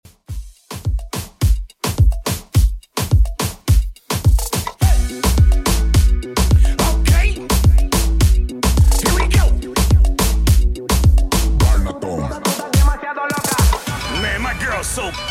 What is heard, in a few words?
Moombahton 106bpm